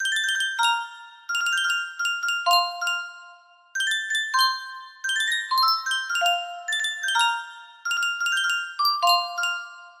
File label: sankyo custom tune music box